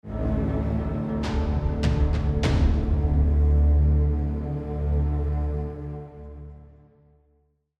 Tension; Match Over

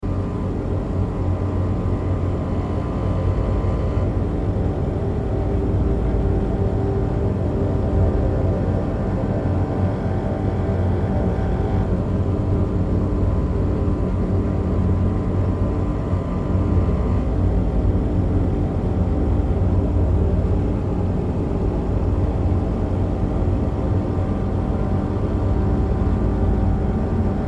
Level 3 Zone 1 Ambience

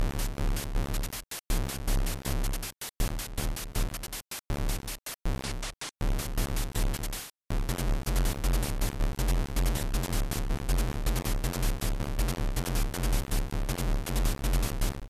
Sample of Drum & Percussion Effects; creative music system; ibm pc xt at

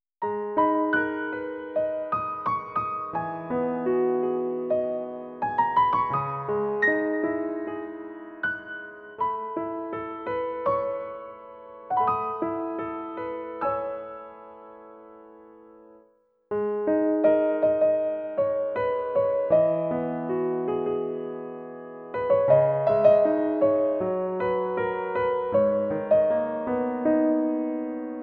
Piano Version; Chou no Doku Hana no Kusari

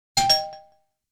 Sounds marked on bonus stage bingo; dondokodon

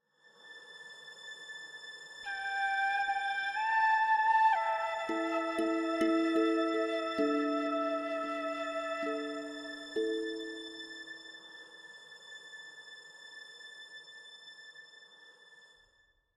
Falling Asleep; Level 08

